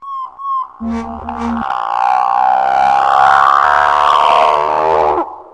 hunter scan; hl2

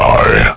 robot pain

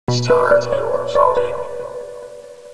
start

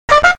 doot

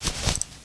mgun draw

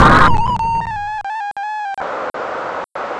die; clonecop

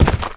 footstep, zombie

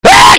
huegh

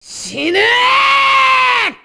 scatter beam ha

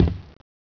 metal

